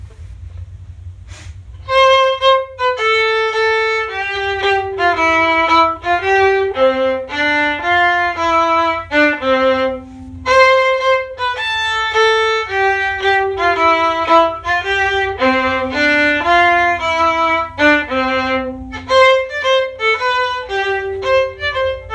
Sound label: Country Gardens